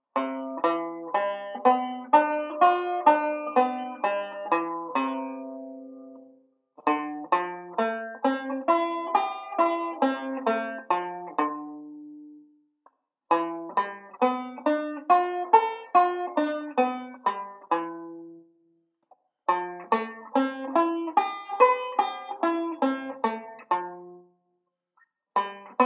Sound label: Modal Arpeggios; Beyond Chord Melody Volume II